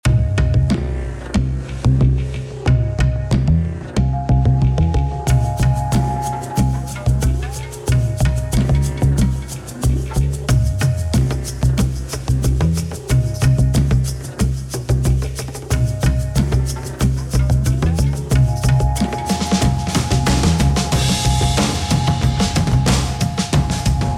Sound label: Multi Ethnic Jam